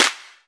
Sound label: Claps & Snares